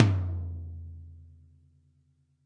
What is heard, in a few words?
real TOM, REAL LIVE KITS; A Real Freakin Drum Kit